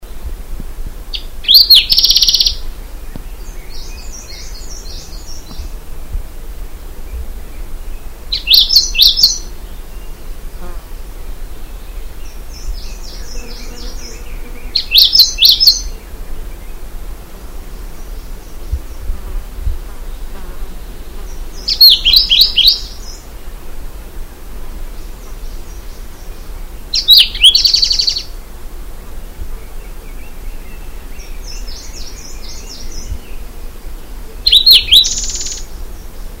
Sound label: Grey Crowned Warbler, China Hunan Hu Ping Shan; pouillot a calotte grise seicercus tephrocephalus